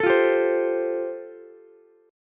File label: A Minor 7th Sharp 5th A, Ukulele; Am7#5 strummed